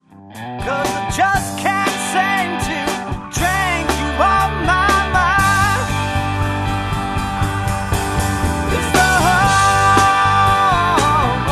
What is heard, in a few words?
Cover Band